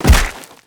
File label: Crushing Infantry